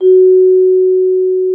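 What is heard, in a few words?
vibraphone F#4; Xsample VOL-4; VIBES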